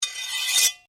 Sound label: Blade Scrape Flick, Sword; Foley sounds for melee weapons; Combat